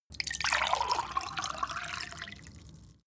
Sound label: glass pour